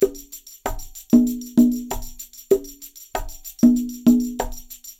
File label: Percussionworks